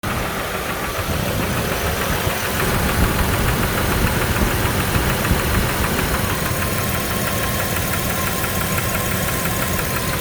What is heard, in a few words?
engine knocking knock; 2.9l 3.0l v6